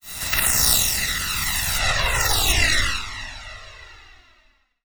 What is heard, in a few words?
HyperFlyer; Zero-G Seismic Frequencies; Flyers